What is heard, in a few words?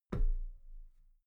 metal ladder step soft muted